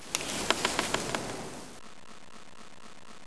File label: chirp